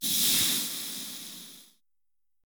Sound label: torch off; adams venture origins